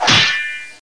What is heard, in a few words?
Sword Shield; Grounch